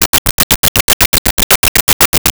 Radio Hit Digital Chopper; Transitional Elements

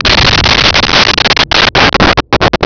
explo muffled

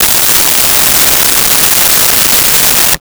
Gas Leak, Mechanical

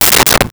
Plates Put Away, Kitchen

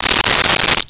Coins Poured Out, Foley

